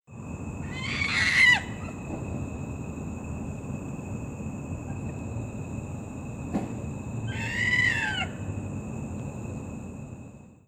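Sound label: Ezo red fox, cub; sapporo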